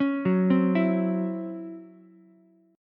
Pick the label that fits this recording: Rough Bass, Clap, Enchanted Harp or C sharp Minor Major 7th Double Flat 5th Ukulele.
C sharp Minor Major 7th Double Flat 5th Ukulele